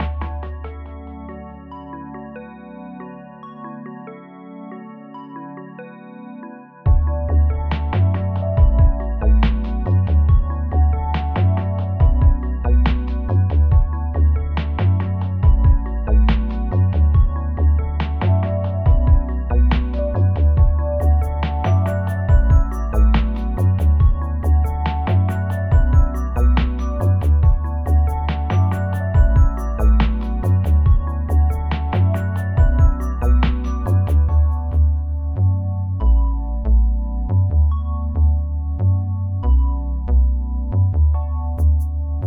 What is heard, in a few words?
Happy Chill Lo fi Beat Loop